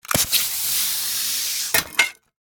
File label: Grapple Gun Shoot, Grapple, Gun, Shoot, Impact; Warfare; Miscellaneous Combat; BlastWave